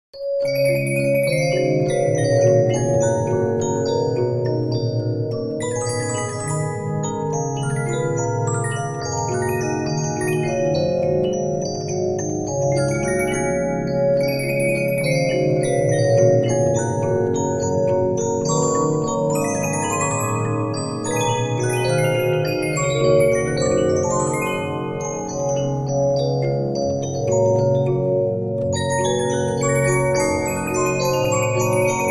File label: Regina, 15inch disc musicbox